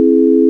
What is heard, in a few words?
sine; 音の波形と分析